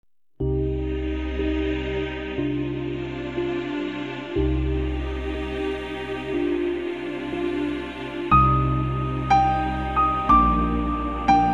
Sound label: instrumental, persian